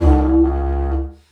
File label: Didgeridoo Kit Samples